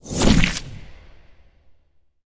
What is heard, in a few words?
webground fire; arachne; smite; arachne skin9